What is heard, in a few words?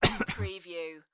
Male Cough; beanstalk audio